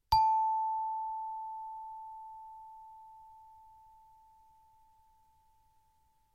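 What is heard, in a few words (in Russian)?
звонок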